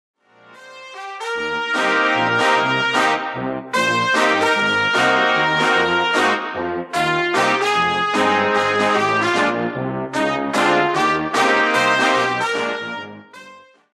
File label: brass